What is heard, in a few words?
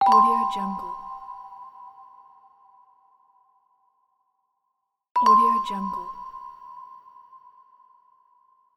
the correct answer